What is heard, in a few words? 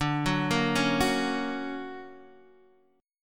D Augmented Major 7th Guitar Drop D, chord